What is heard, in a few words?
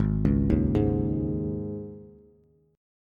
Ab Suspended 4th, Bass; Absus4 arpeggiated; JGuitar